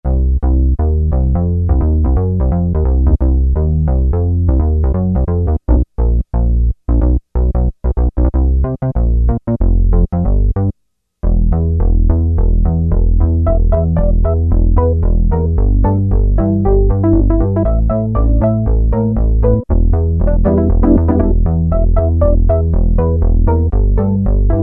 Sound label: Bass 1, Roland Juno-60; Factory presets